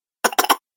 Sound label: Coughing Cartoon Game Voice